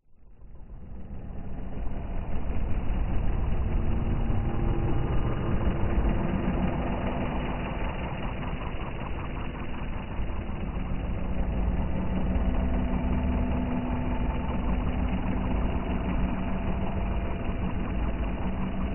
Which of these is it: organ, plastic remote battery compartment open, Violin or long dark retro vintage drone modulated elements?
long dark retro vintage drone modulated elements